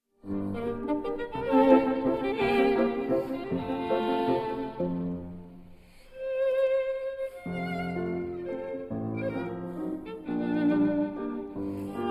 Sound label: old viennese dance for violin and piano